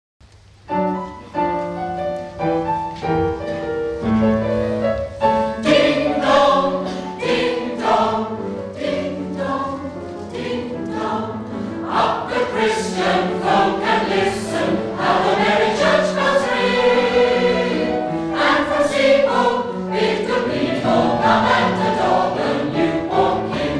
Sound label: Ragtime Carol